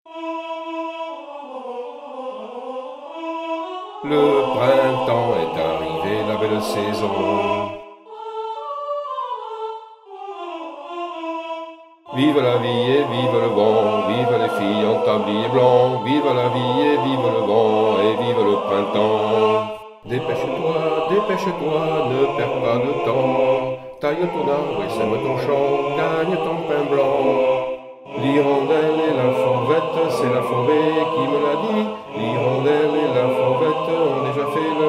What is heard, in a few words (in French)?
chant basse